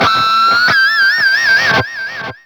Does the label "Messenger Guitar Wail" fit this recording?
Yes